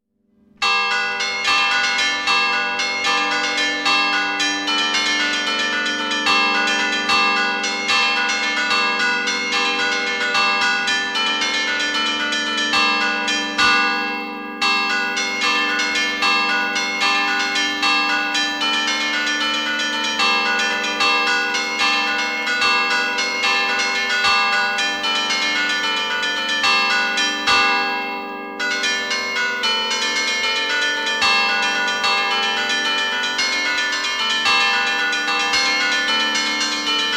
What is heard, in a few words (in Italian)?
campane